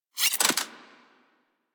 Pistol Mech C; Sybaris

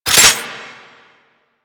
Reload; Gotva Prime; Warframe; weapons

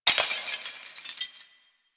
desktop glass destroy; ambience; Warframe; Entrati

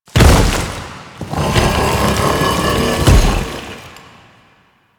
Qorvex Idle Agile Speargun; Warframe; Warframes; Idles

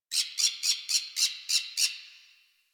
Tree Critters Happy Calm; Ambience; Props; Warframe; Duviri

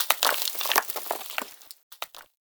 Falling Sand Rock; Warframe; Man In The Wall

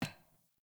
Grunt Boot Walk Metal; Characters; Enemy; Melee Grunt; Footsteps; Boots; Sinecure

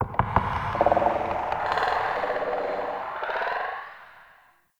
Cave Trippy Knock, Ambience; One Offs; Neathuns Root Cave

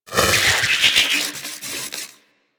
Shockwave Moa Grapple Proj By; Warframe; Venus; Venus Terra Shockwave Moa; Abilities

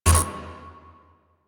Pistol Active Reload Fail; Weapons; Warframe; Drifter; Operators

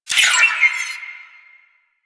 Medusa Button Timer Failed; Sentient; Warframe; The New War; Sentient Devourer

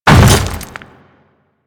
Hammer Boss Step Dirt, footsteps

